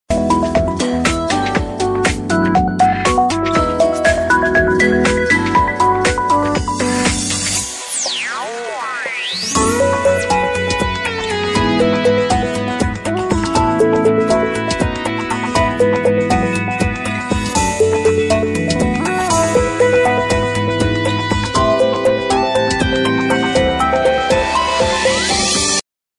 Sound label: Exciting Ringtone